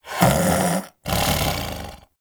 Snow Critter Vocal Snore; Hunting Critters

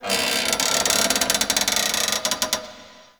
Metal Creak, Ambience; Warframe; The New War; Zariman

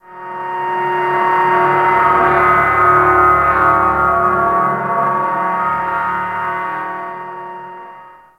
Creepy Screech; Warframe

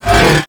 Orokin Derelict Mover Malfunction Start; Warframe; Doors

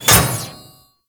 Kunai Impact Default; Warframe; Hit Effects; weapons

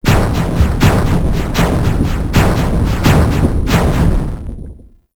Boss Defeated Explosion; Warframe; Caliber Chicks

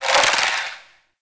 Mummy Scarab Swarm Augment Proc; Warframe

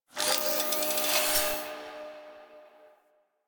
Mods Station Anim On To Off; Interior; Ambience; Warframe; Nineteen Ninety Nine; Tailor Shop

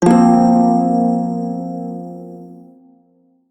Prime Shawzin Blues Chord, Music; Warframe

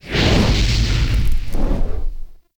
Fire Engulf, Exterior; Warframe; Awakening Remaster

